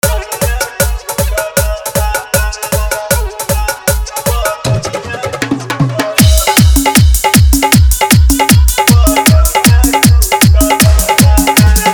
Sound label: Fully Dhollki Rod Dance Mix